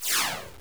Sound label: run